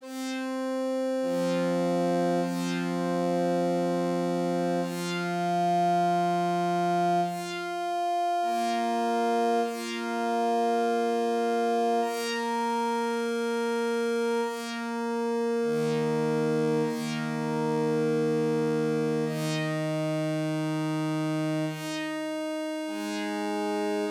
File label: descending fifths 12et bright pad